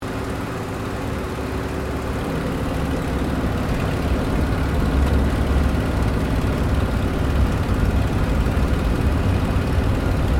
AIRCRAFT SUPERMARINE SPITFIRE MK-VIII GEAR DOWN INTERIOR